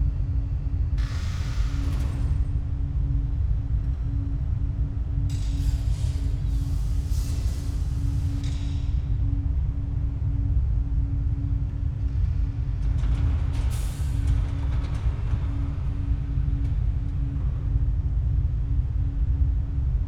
Lower Level Ambience

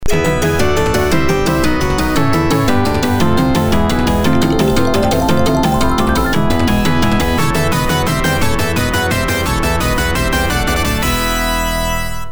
Stage Clear; Smash TV

